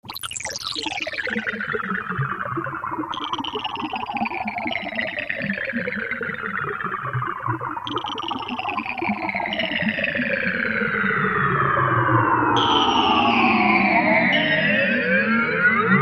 fm blips

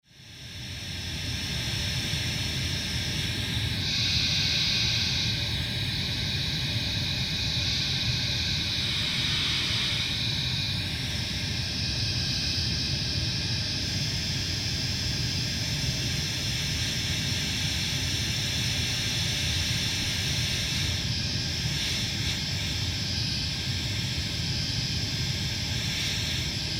white noise to cancellation

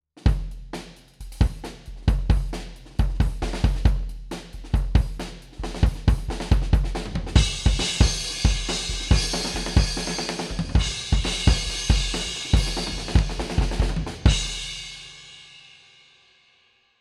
kick distanced

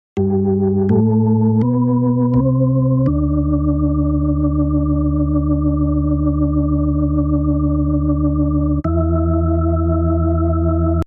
organ before

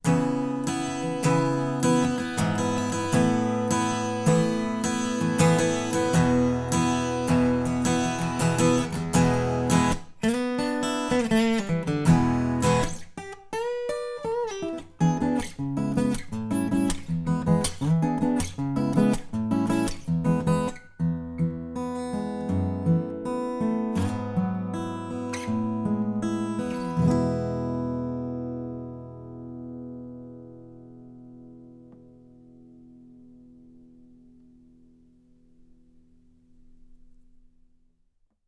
alt gtr over tail

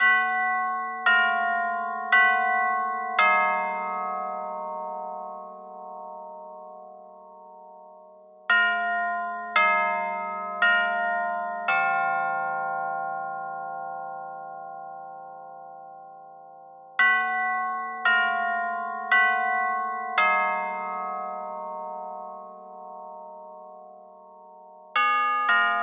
bells original

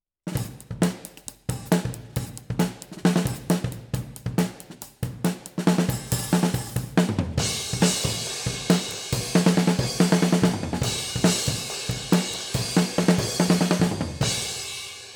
snare top pos oh

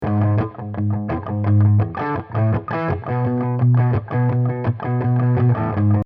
guitar before

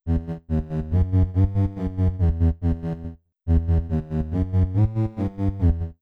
bass original

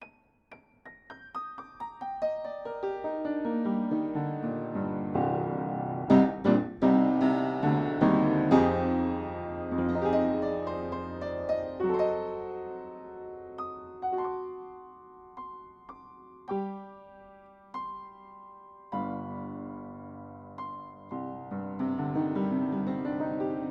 upright panels on inside pair

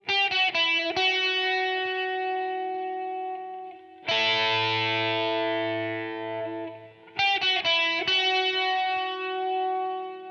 gtr processed

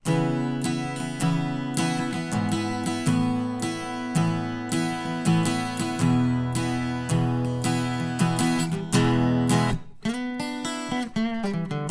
alt gtr

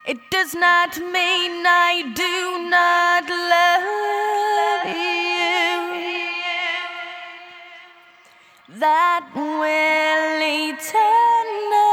vox processed

